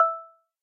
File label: timer final second